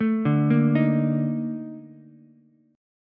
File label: A sharp Major 11th Ukulele, chord; A#M11; arpeggiated; JGuitar